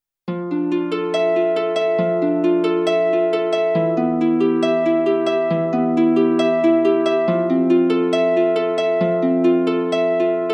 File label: Synth Pads